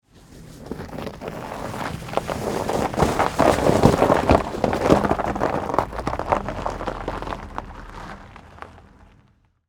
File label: Saturn Vue, Automobile, Pass, By, Slow, Snow, Grass; Transportation; Ice; Cars